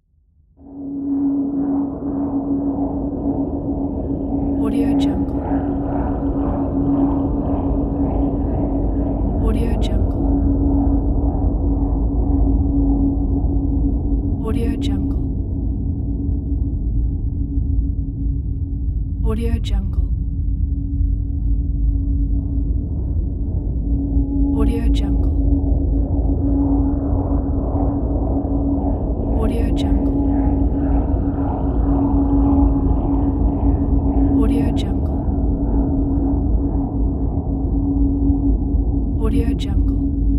exploration drone; applehillstudios

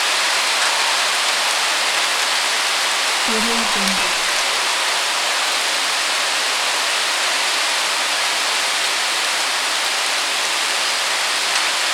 rain loop

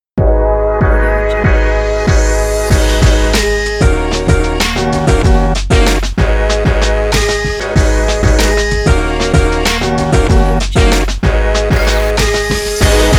fashion hiphop energetic logo

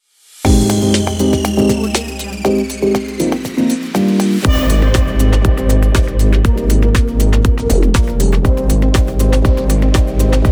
for fashion logo

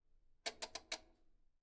washing machine rotary dial loose clicks turns

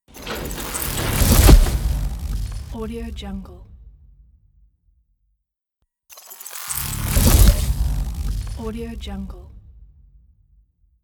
hitech digital motion transition

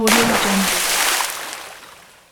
diving

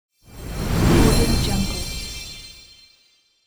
chiming whoosh

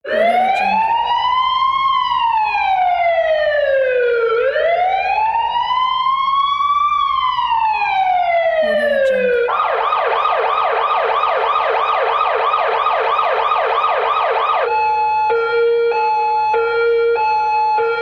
police siren

